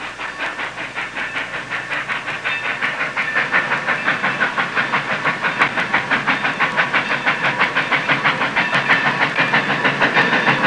train leaving; Transportation

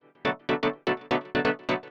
synth house 125 A; eJay; Keys

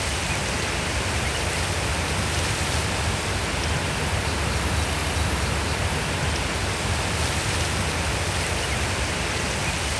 ambloop; DAoC

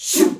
v perc, vocals

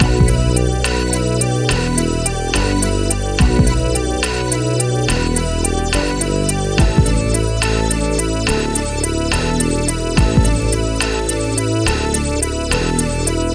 loop

